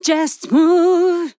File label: LYRICS Fills 120bpm Fm